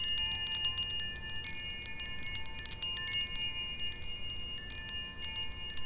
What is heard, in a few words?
Pretty Rod Loop